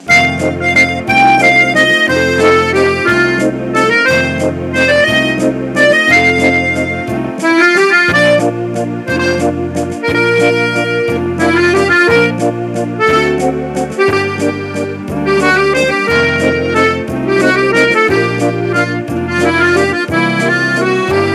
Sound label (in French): valse viennoise